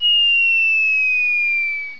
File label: bomb dropping, cartoon